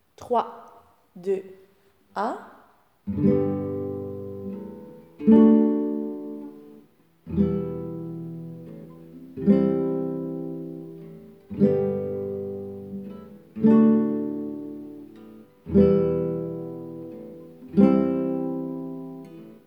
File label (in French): chanson avoir instrumentaal